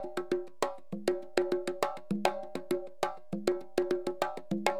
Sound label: CONGA, Perc; LiveAct Pool; AcidJazz Funk